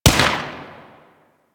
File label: Bladed Pistol Shot; Warframe; Tenno